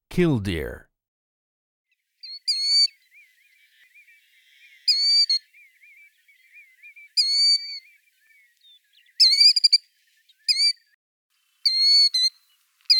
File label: Killdeer